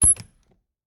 Refridgerator, old fashioned, wooden, steel, brass clasps, hinges, close, squeek, piercing; Eiravaein Works; Vaeyan IV